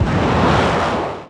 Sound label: Missile Launch; Defense Commander